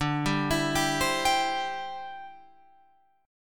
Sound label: D 7th Suspended 2nd Suspended 4th D, Guitar Open F; D7sus2sus4; arpeggiated; 14,14,16,14,15,14